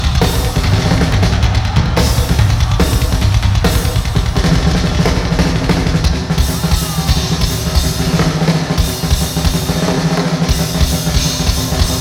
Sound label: Drum Solo